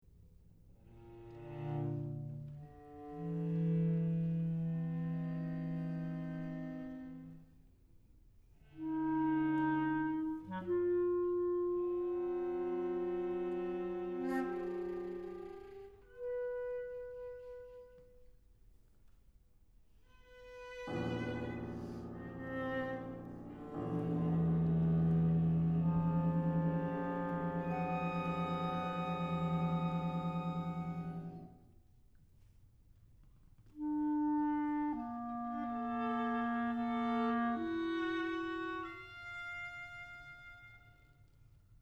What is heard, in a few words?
Ensemble Music for Six